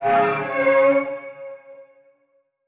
Transition Musical Tone Drone; Production Element

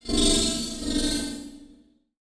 Transition Whoosh Warble

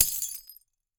Crashes Glass Impact

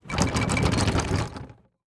Sports Bag Golf Bag Full Shaking Fast

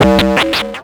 Multimedia Internet CD-Rom Flash Hi-Tech

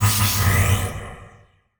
Title Transition Blast; Production Element Title Transition

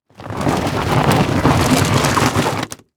Impact Treasure Chest Drag On Rock Salt

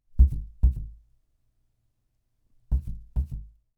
Horror Scary Human Heartbeat Slow

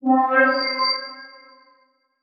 Transition Musical Tone Warble

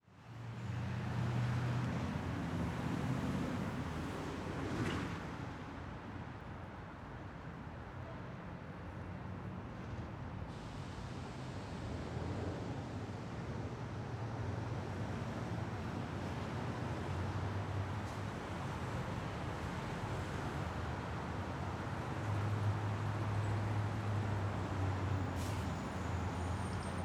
Ambience Traffic With Engine 180D; AdobeCC

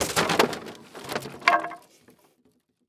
Impact Wood Crash Long; Impacts

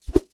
Weapon Whips; AdobeCC

